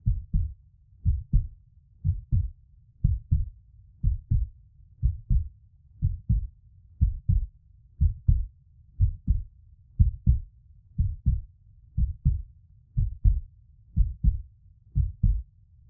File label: Heart Beat Calm Rhythm Blood Flows In The Veins